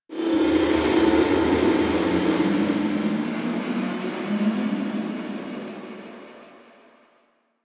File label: Mid Texture; Warframe; Quests; TheNewWar; Ambience; Zariman